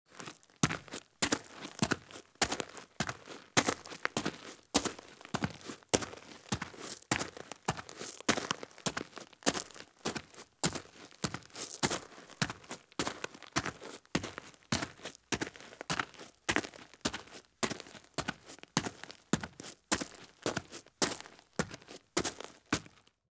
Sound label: Heavy Boots Walk at Very Fast Pace on Rooftop Pea Gravel; Sound Ideas; Footstep Sound Effects